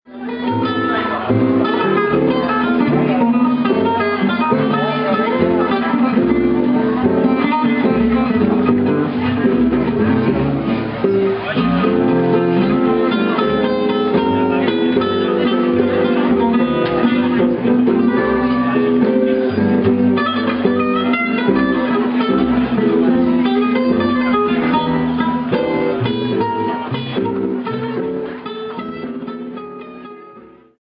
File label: jazz; nyon